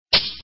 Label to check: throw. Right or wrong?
Right